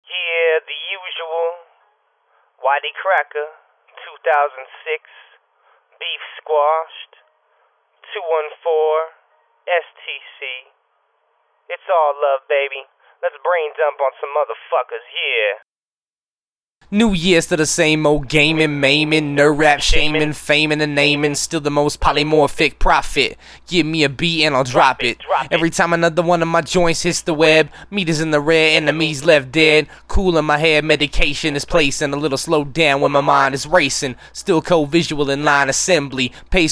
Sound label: acapella